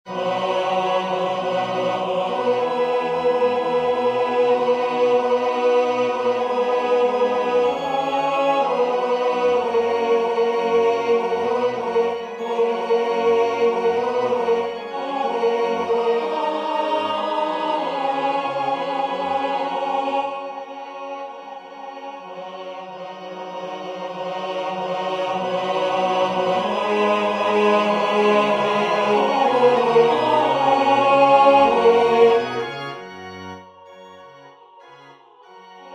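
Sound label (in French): tenor, Musique sacrée; Exultate Deo; Haydn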